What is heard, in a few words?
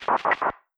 filtered noise